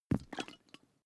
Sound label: hot-water bag hit